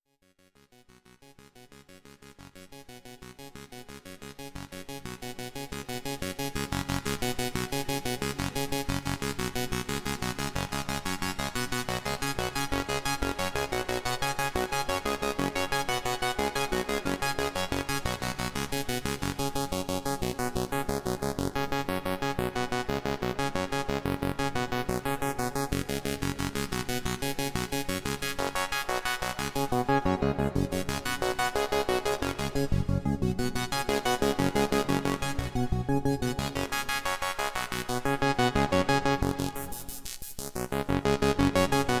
doepfer a 121 a 138j

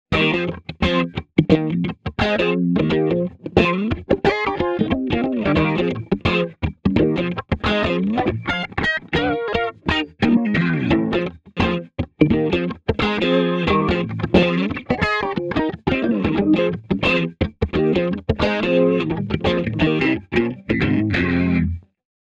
Selective Harmony

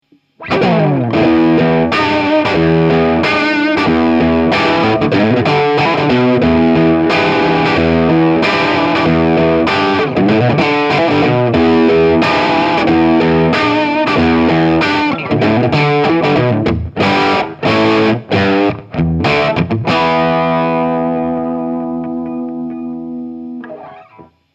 Electro Harmonix 5MM Full Throttle Boost

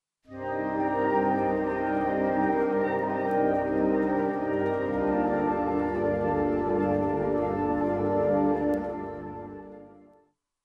winds orchestra sluring; NI arkhis

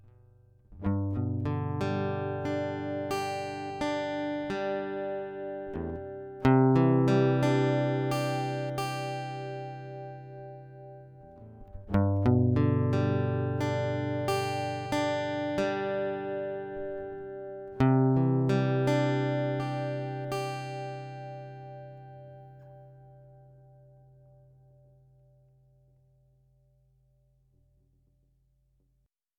Golden Age PREQ Neve1073 Guitar

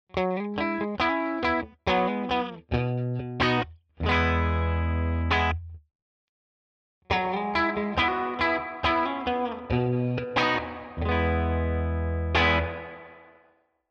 Walrus Fundamental REVERB Spring

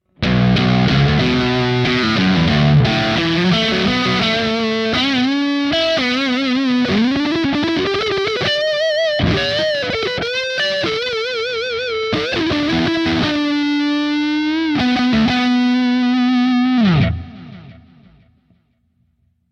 Neck Humbucker Highgain Lead; Reverend Descent W

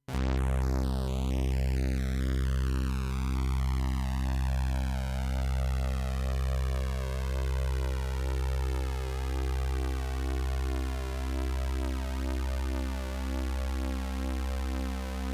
XL SAW Waveformmod

